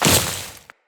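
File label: footstep on vegetation, enemies; Footsteps; Grineer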